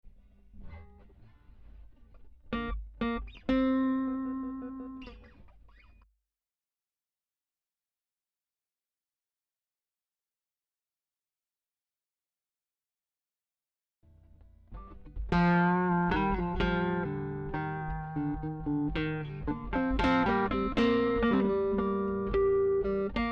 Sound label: Groove